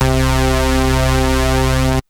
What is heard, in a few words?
Saw; Ableton; Wicked Kits; MegaWave